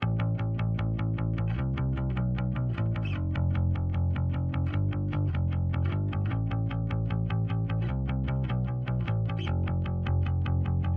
Rock Dirty Electric Bass Dry

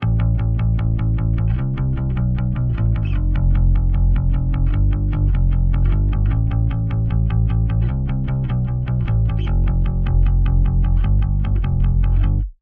Rock Dirty Electric Bass Wet

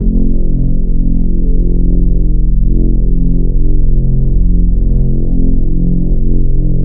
Reese Drone Synth Bass Wet

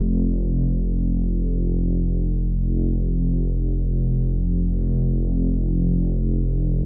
Reese Drone Synth Bass Dry

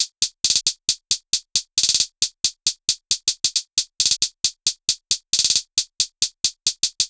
hihats DRY; metapitch

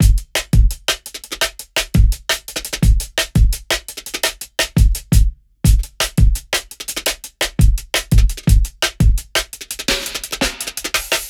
Breakbeat Drums Wet

